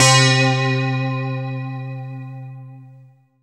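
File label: synthesizer clear, digital; Sound & Vision - Gigapack I CD 2 (Roland)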